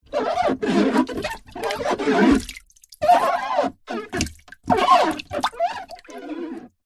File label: foley plastic tub water shallow fingers rub squeak wet water movements